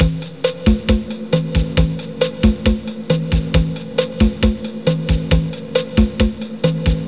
Mambo, DRUM MACH; Leonardo The Inventor; Broderbund; Riverdeep

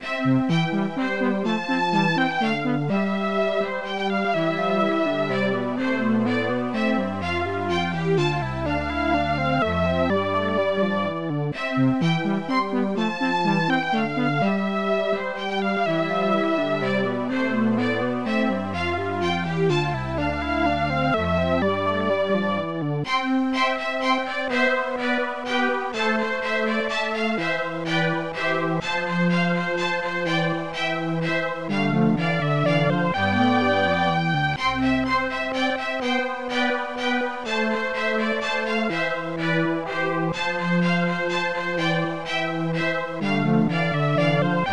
SYNTHETI

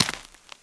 dirt; ambient; Shadowman

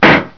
bang

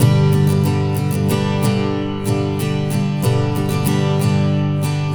Strummed, Acoustic Guitars; ACID MUSIC

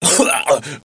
choke; player; blade

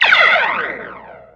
synth laser; Earth and Beyond; EA Games

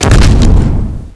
explosion barrel; Army Men TiS

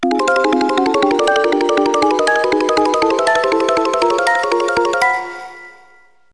long tune; music

